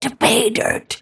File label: move pain; snapfire